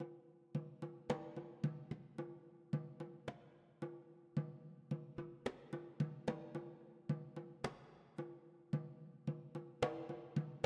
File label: Drums Mid